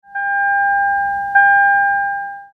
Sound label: Lexus bong